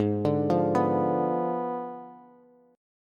Ab Diminished 7th Ab, Bass; Abdim7 arpeggiated; JGuitar

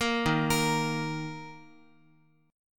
Eb 5th, Guitar; x,x,x,3,3,5; JGuitar

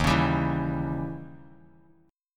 E flat 7th Flat 9th Bass, chord; 11,16,17,9; Bass Standard; Eb7b9 strummed; JGuitar

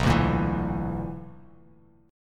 B-Minor Major 7th Double Flat 5th, Bass Standard; BmM7bb5 strummed; JGuitar

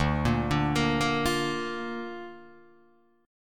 D Minor Sharp 5th D down Guitar DADGAD

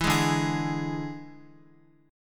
D Suspended 2nd Flat 5th D Guitar; x,x,4,1,2,4; strummed; 4F,4A,5C,5G,5C,6E

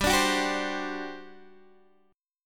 G Major 7th Suspended 4th, Guitar, Open D; x,10,12,12,10,10; down

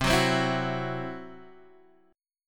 C Major Flat 5th C down Guitar Open G

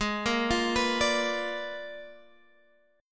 A sharp 7th Flat 9th G sharp

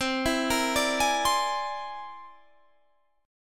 C Augmented 9th

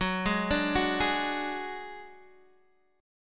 Gb Suspended 2nd Sharp 5th, Guitar, DADGAD; down; chord shape

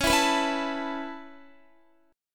Db Minor 7th Sharp 5th Db down Guitar; strummed; JGuitar

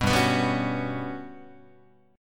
G Major 7th Flat 5th, Guitar; strummed; JGuitar

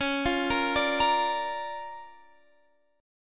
D Minor Major 7th Sharp 5th, C sharp

